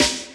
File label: Snare